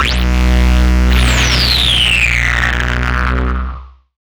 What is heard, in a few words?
Insectus, Hard & Loud Techno; USB Soundscan vol.01; AKAI